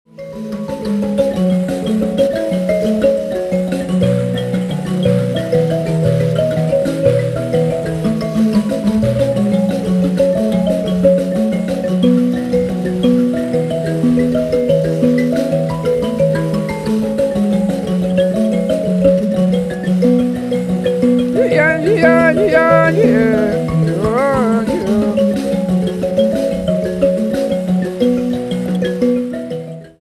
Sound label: mbira